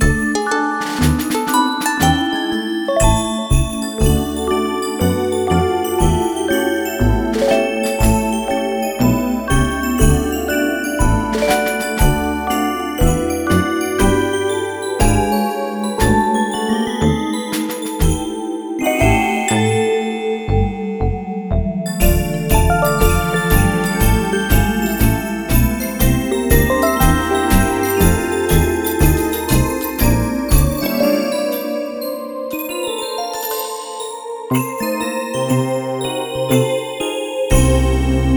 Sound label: Base Idle Plant Snow; Rush2